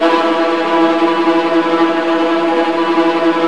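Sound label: viola tremolo E3 left, Virtuoso Strings; ILIO; Kirk Hunter Virtuoso Strings VOL-2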